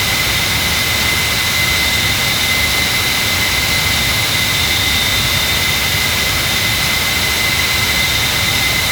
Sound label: Aircrafts Engines Ka50 Around Engine; DCS